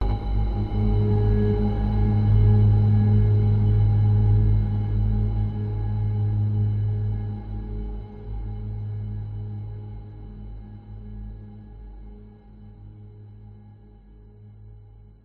horror dark discordant hit long decay atmosphere tension darkness sinister pads